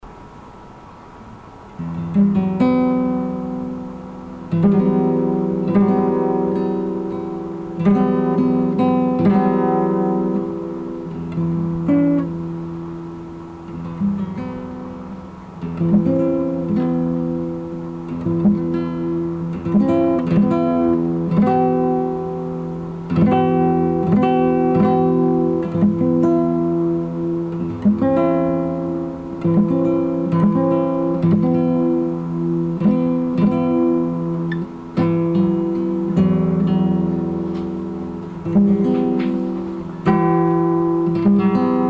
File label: Guitar